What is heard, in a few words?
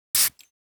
perfume; house